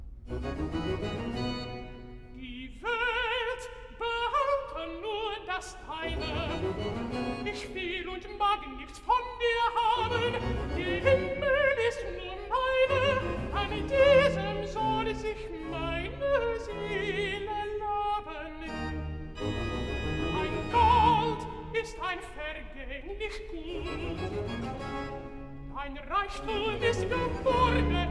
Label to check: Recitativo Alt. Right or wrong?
Right